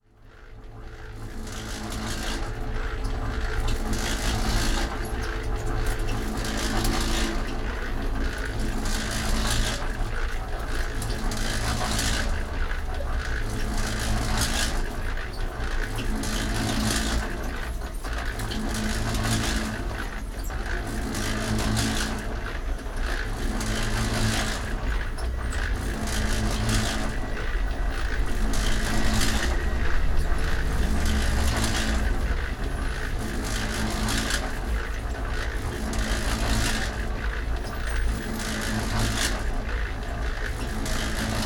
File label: cement mixer; yaroslavl